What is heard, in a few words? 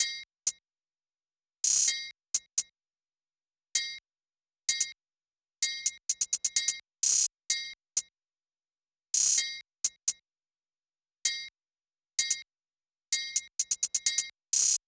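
Drum Loop 128BPM hi-hat; VEC5 Drumloops; VEC5 Multi Loops; Loop Elements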